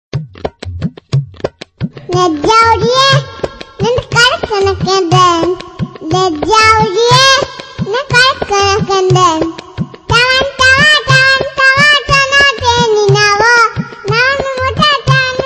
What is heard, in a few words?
Cute Baby Song